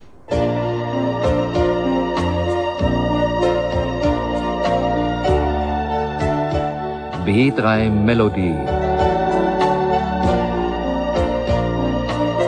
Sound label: Melody